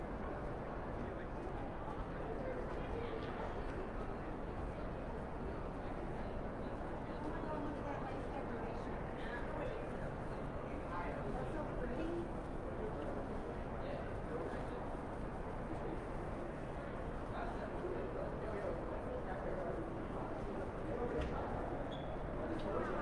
ambience shopping mall resonant hall crowd 3rd floor toronto canada loop; sonniss gdc